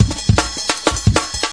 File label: dynamic, drum loops and breaks, 157 bpm; 141 to 160 bpm